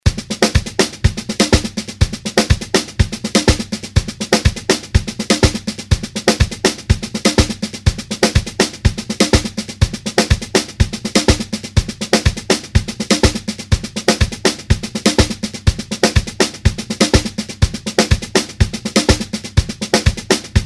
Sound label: JAZZ MAN